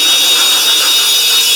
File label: STORM; Northstar; The Wizard VOL-1; TERROSTROIKA